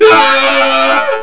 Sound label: scare